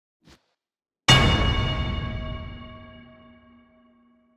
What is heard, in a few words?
death bell; gang beasts